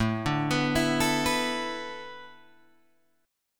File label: A Suspended 2nd Suspended 4th A Guitar Open G, chord